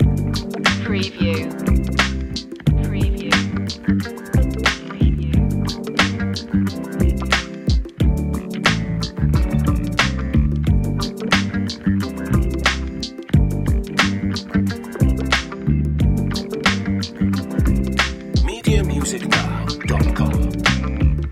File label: funky sweet relax loop